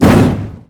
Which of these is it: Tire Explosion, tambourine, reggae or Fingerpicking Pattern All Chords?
Tire Explosion